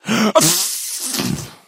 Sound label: die